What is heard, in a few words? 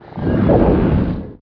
attack muffled single, antlion; npc; Half Life 2; Episode 1